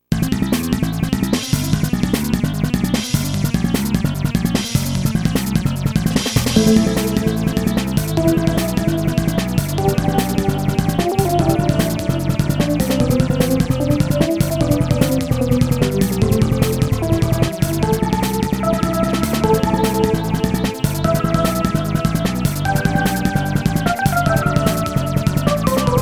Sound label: Bass; Mega Man 8 (Saturn)